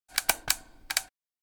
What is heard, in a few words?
Hair dryer switch buttons; Household Appliances